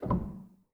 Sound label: rel, Grand; bitKlavier; Binaural